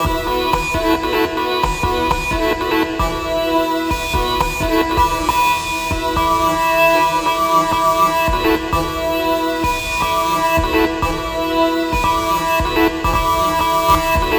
Sunscoop Melodic, Tonal Atmospheres; Sonic Foundry (Sony Creative Software); Myths of Technology

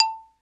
XYLO; Roland - LA Composer vol. 1; STANDARD PRC

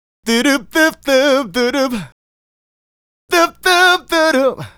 Male Solo, Vocal

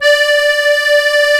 DIATONISC, AKKORDEON

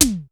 SPACE TOM H, drum; Crypton - DrumBase5000

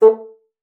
bassoon, A3; Xsample VOL-6

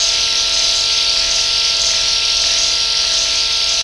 tech fx; Best Service; Hyper Dance; EFFECTS

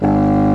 DOUBLE REEDS; OMI - Universe of Sounds

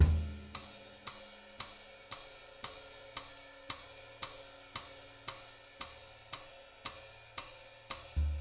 114 CHA, CHACHA, Living Drums; Sampleheads; Peter Erskine; JZ114 CHACHA

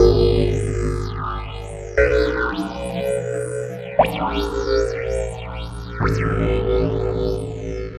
DigiDeru Bass, Bass Foundations; Sonic Foundry (Sony Creative Software); The Electronic Music Manuscript Collection

